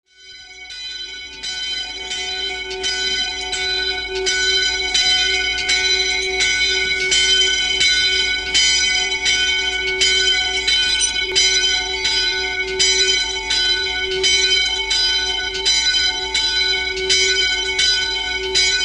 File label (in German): Glocken